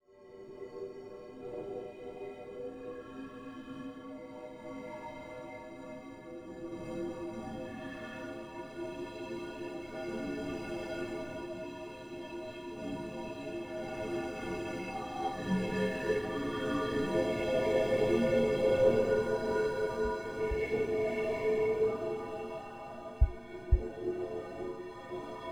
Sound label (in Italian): battito cardiaco; Original tracks for the theatre show directed by Enrico Fumanti and Ezio Lappano